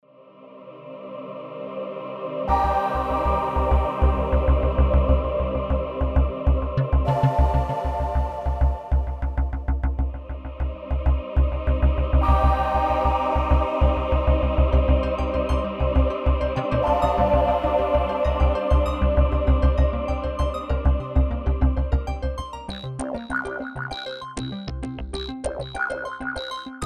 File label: Clusters Pads Arp